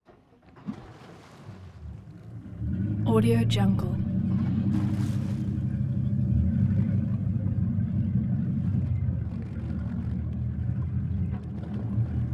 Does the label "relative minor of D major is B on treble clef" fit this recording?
No